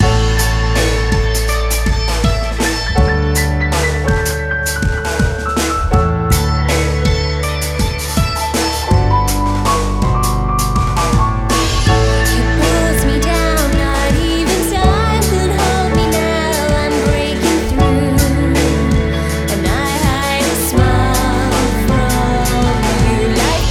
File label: Mix FX